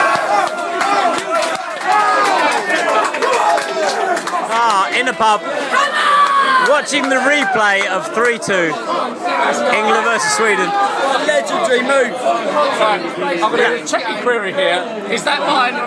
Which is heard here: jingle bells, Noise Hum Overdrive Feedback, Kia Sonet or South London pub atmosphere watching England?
South London pub atmosphere watching England